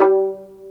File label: PIZZVLA D2; AKAI S-Series CD-ROM Sound Library VOL-2